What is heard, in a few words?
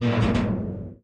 metal groan